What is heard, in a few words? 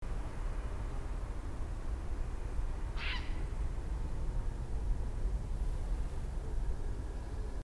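aosagi, grey heron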